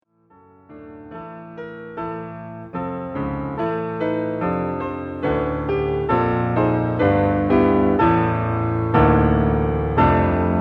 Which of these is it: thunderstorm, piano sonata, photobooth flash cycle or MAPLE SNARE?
piano sonata